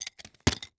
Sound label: Weapon Nunchaku Fall On Dirt; AdobeCC